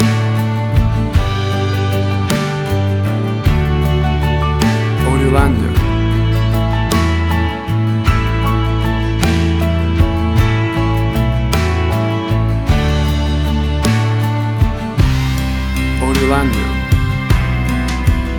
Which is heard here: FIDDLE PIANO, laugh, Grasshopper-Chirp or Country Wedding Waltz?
Country Wedding Waltz